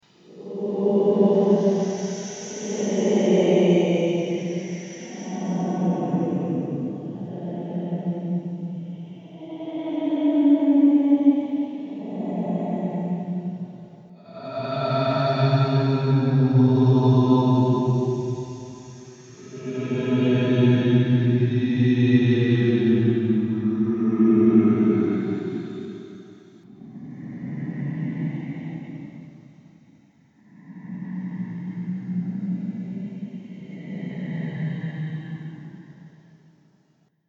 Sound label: haunted mansion echo ambiance; پکیج کامل افکت صدای ژانر ترسناک